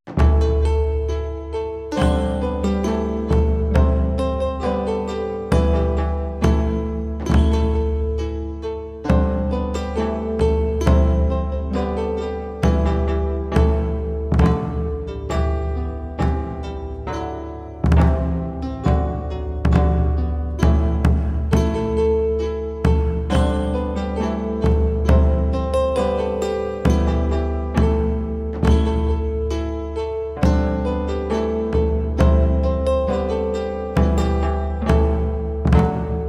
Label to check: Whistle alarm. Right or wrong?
Wrong